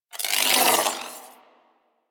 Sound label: Ball Held Spark; Warframe; Missions; Tenno Ball